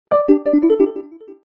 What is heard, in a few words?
Samsung Galaxy S24 Ultra SMS, suoneria